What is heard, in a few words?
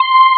INHARM C5; E-MU Formula 4000 Series Vol. 3; Analog Odyssey; Synth Builder